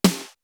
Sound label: R8 PERC; Greytsounds VOL-1